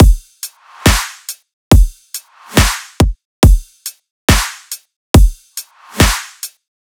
Riddim Drum Loop 140 Full

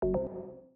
cursor click; PremiumBeat SFX

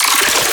Bass Hit D#, Dubstep; Radiance Bass; Ghosthack; AC2024 - Day 13 - Dubstep Sounds 2025

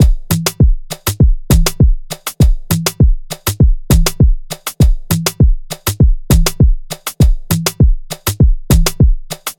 Drum Loop - 100 BPM (Afrobeats); Cymatics; PARADOX II; Drum Loop Collection

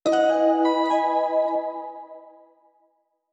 80 BPM E Maj Key; Loop Stems; Oceanside; Gems Free Teaser Pack

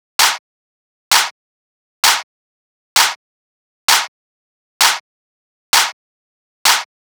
Clap Loop 130, Techno

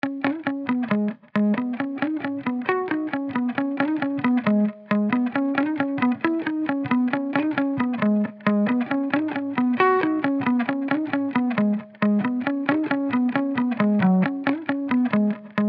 135 BPM G# Min Lead Guitar; Loop Stems; Cymatics; Reasons

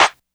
Clap, Drum One Shots; Cymatics; Eternity Sample Pack

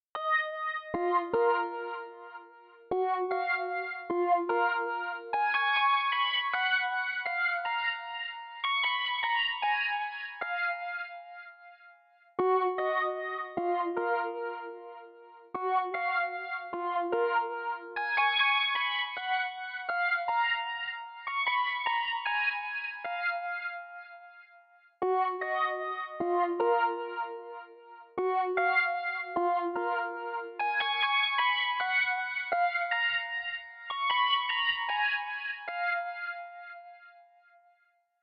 152 BPM D# Min Synth; Loop Stems; Cymatics; Mosaic

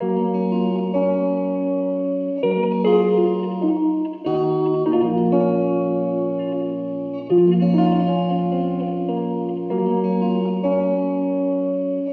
Nostalgic Melody Loop - 99 BPM F# Maj; Cymatics; Oracle Sample Pack

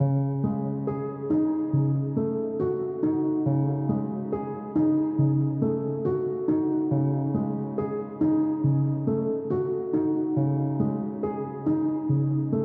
139 BPM C# Min Piano; Melodies; Loop Stems; Cymatics; Monarch Melodies; Orchestral; The Mist

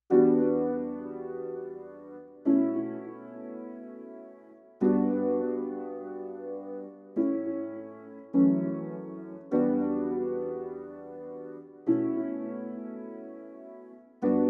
102 BPM B Min Piano; Cymatics; Infinity Melody Collection; Frustrations

